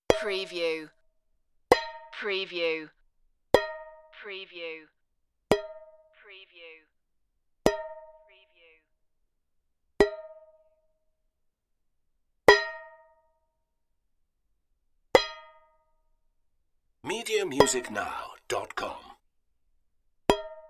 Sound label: sheet metal hits